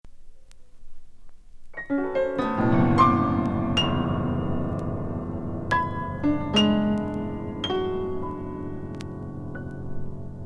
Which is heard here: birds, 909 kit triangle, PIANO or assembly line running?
PIANO